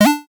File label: retro coin, synth